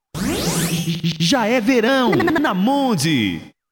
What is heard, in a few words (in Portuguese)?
Mundi FM Jingle Verao